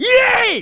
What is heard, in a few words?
yay; broly